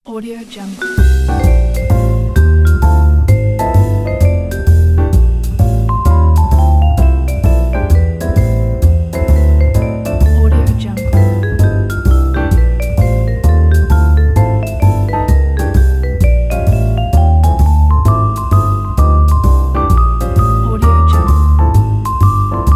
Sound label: jazzy advertising